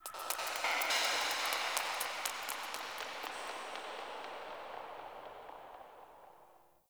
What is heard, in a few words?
Memory Echoes, Ambience; Warframe; The New War; Zariman